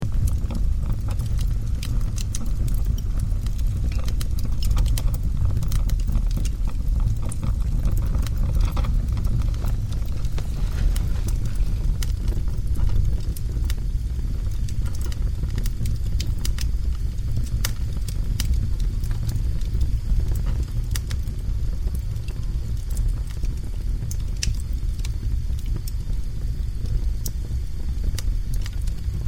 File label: Fire, ambiente, colline; Questi suoni di "ambiente" sono stati registrati nelle colline parmensi (Lago Santo) nell'estate del 2002 mediante il mio minidisc Sharp; Fuoco